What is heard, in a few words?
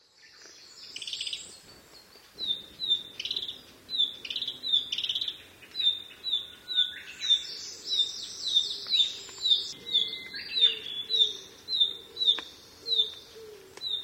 kibitaki; キビタキ; 地鳴き; 2014年5月24日 静岡県富士宮市